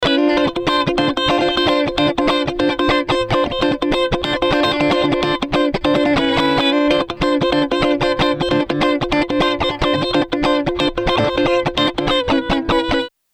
Tierra Audio Gravity VCA guit atk fast rel

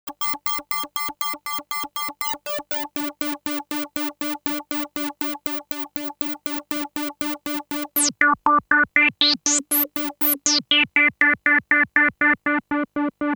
Cicuit Bleep Chord

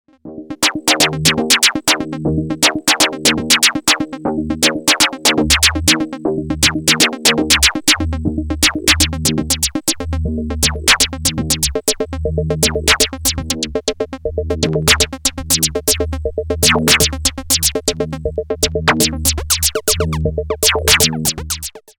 Beat; Erica Synths Perkons HD-01

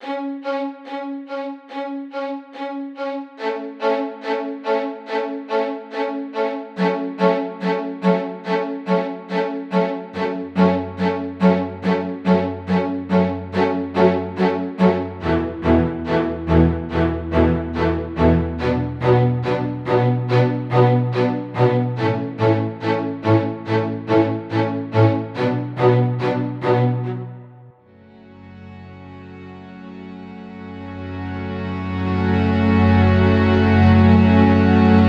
Strings

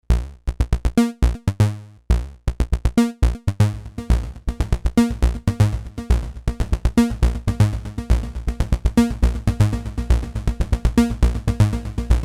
Demora Dark Energy Delay

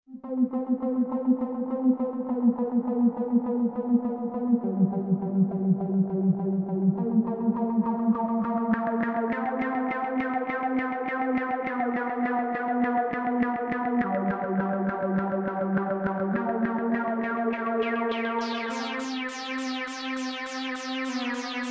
Synth DI Transformerless; UnderToneAudio MPDI-4

